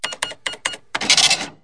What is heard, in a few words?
electronic cash register; Sound FX Real Life